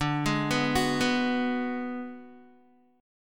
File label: D 6th Flat 5th, Guitar; D6b5 arpeggiated; JGuitar